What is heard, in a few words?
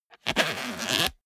zipper small case close